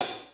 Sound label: half hat